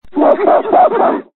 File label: charge ape, Voices Emotes